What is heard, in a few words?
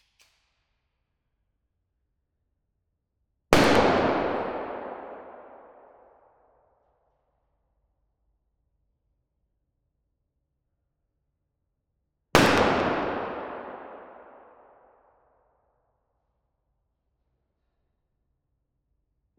Large Warehouse Colt M1911 .45 ACP Pistol 10m Single Shot; MKH8040 Pair; Audiobeast; The London Warehouse Firearms Library